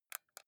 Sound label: console controller xbox joystick press